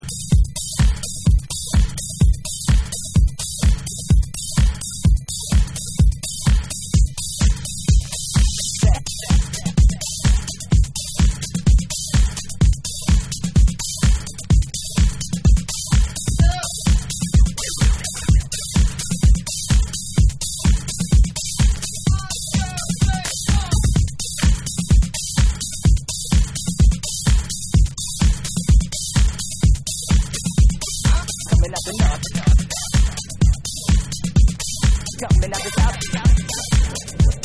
Drum Mix

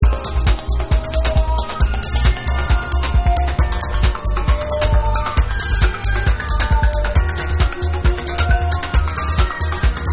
TRUMPET

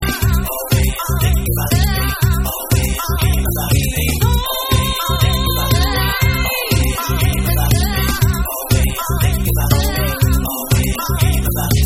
Alternative Bass Mix

